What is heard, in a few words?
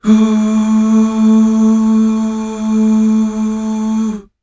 UUH